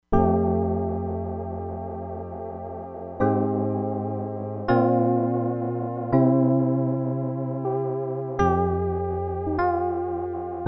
MALE SPACE CHOIR + ELECTRONIC PIANO, Crumar Bit 99; Factory Programs